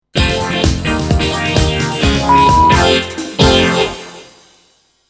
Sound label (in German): Sound Logo mit Sound Bett 2 Toene funkig; Soundlogos für Ihr Corporate Design